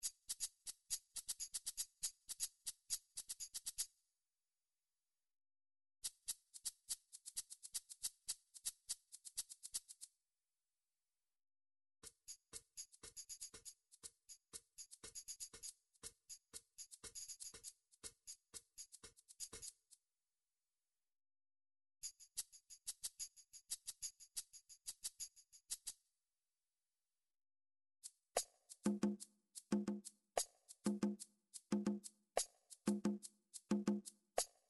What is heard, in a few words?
midi drums shakers